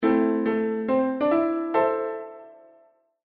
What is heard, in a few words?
Game Over (Highscore); Mr. Bean - Bean Skidding